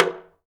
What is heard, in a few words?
TALK DRM, Heart of Africa; Spectrasonics